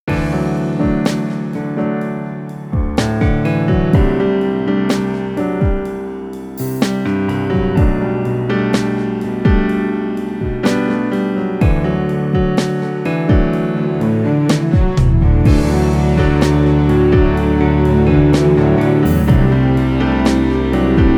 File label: Sweet strings; SonicByte